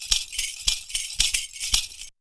PERCU; Univers Sons; Raricussions

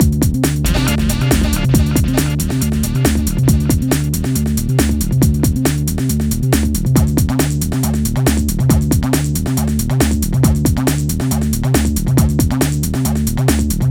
Moogyourass, 138 Dmaj, Hardcore Breakbeat; Big Fish Audio; Hardcore Breakbeat Action

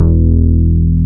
BAS MKS-50, Roland

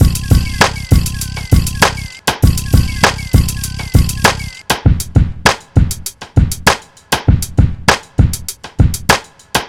Drums, Hip Hop

